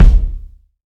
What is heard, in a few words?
puffy kick drum sound d sharp key; kick drum samples